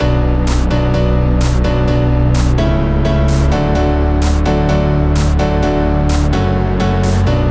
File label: Special Sounds Root B 128 BPM; Vengeance Samples; VEH3 Special Sounds